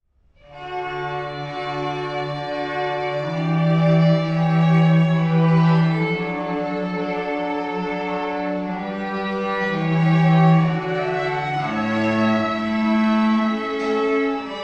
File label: strings